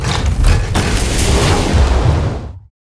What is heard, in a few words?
skill robot break